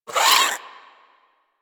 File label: vocal attack, critters; Warframe; Hunting Critters; Nexifera